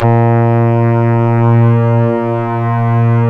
Synclav FM STRINGS A#; Big Fish Audio

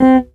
Chapman stick lick; Greytsounds

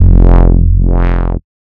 BASS, "(F)"; neuro; Stanza

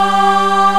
ORCHEVOX G4, Choir Orch; HigherChoir Orch

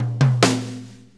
6-8 Fill, Drum Tools; Sonic Foundry (Sony Creative Software); Loops & Samples Drum Tools; Fills

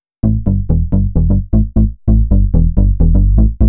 Bass, uk garage, 130bpm; Zona

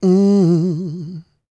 MMM, Vocal Blues Soul; Spectrasonics; Planet

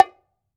H-BONGO OP; AKAI S-Series CD-ROM Sound Library VOL-5

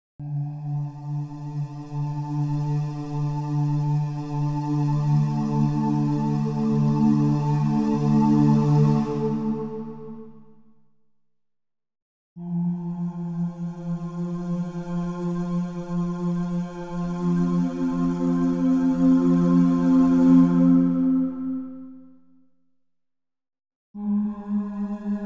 Huhh Voice D+3; Klaus Schulze Collection; Choir & Voice

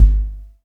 BOOM; Best Service Dream Experience

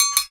PRC METAL, Africa; Roland LCDP14 Africa VOL-2

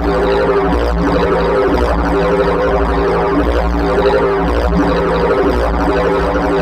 FUZZ RIDOO, FUZZERIDO; Spectrasonic Distorted Reality 2

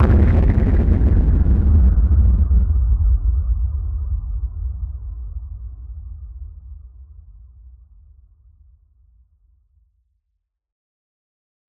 Blast, Explosions

